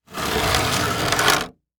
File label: Impact Crate Dragged Wood Crate On Concrete